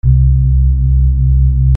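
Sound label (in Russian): голос орган бас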